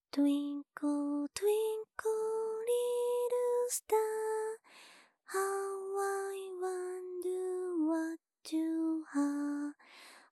きらきら星英語, 子守唄

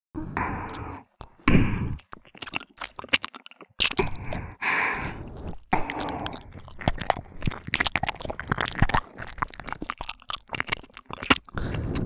中に出して♪ 耳舐め

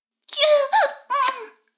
フェラ音声; 逆時間停止されて

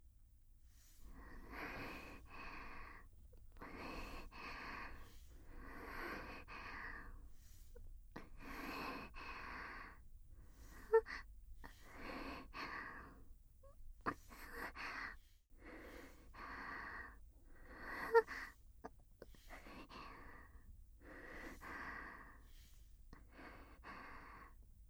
添い寝授乳ループ

